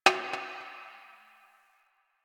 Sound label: Garbage Metal Can; Warframe; Ambience; Nineteen Ninety Nine; DE